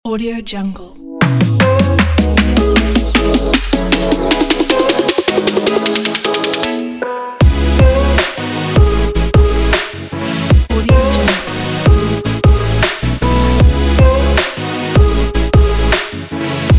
positive future bass

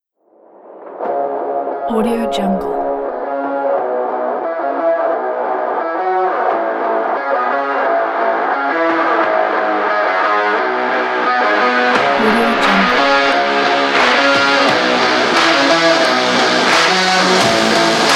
fuzz dramatic rock action sport

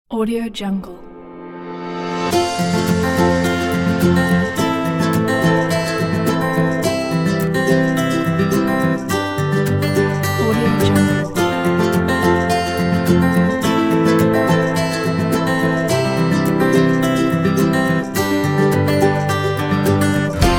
easy day

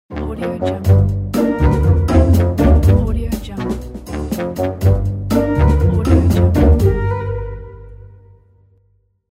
funny logo

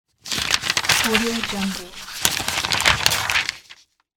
turn newspaper

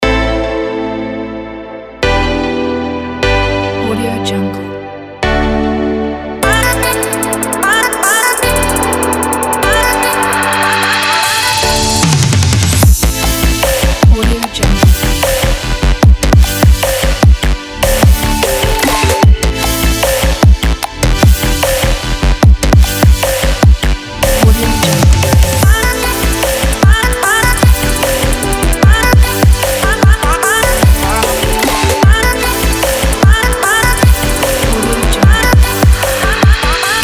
an inspiring future pop

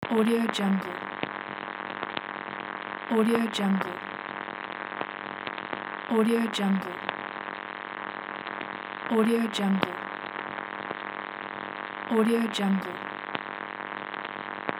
voltage noise

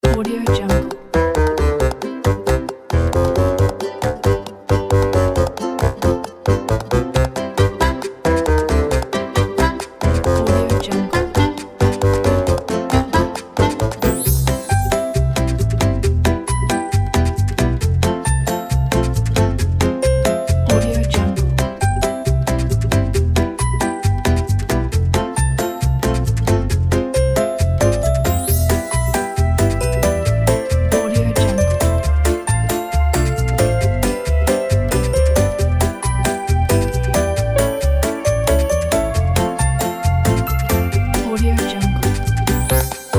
the funny

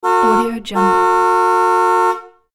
car horn; umutkorkmaz

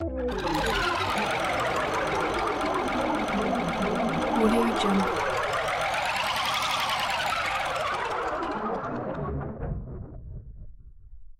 scifi vehicle engine malfunction

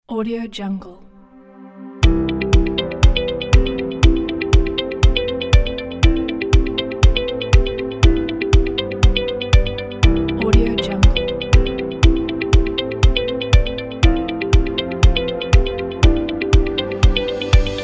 corporate upbeat background; corporate upbeat background 2

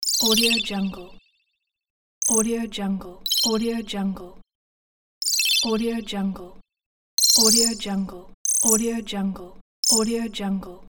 futuristic glitch sounds

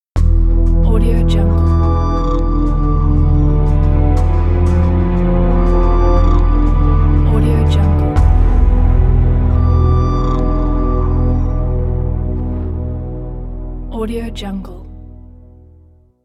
gloomy atmospheric halloween logo

